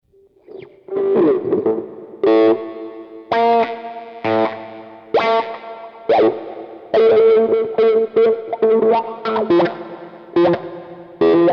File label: electric